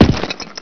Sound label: step, player; firearms